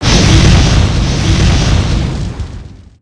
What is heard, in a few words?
Dragon Flame; creatures organic